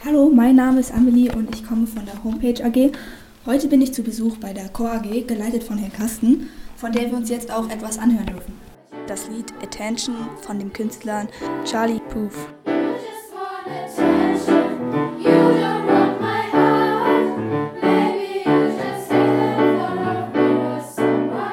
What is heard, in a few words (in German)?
Vorstellung Chor AG